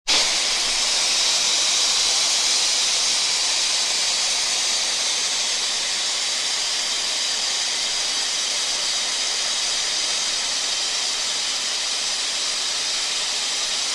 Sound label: Long Air Blast From Co